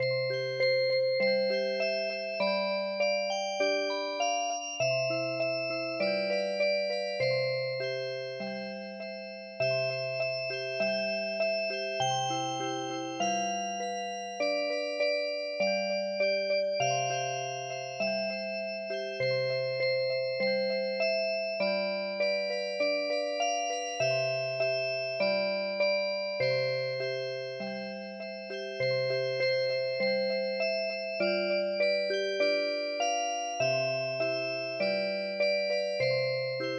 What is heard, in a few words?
Music Box